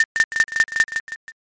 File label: knife